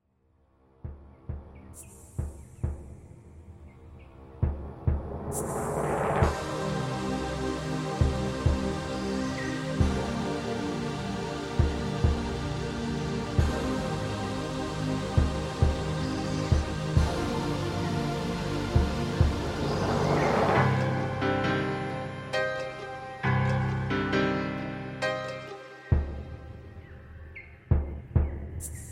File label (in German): Instrumentalmusik Bergsommer; vogelstimmen im gebirge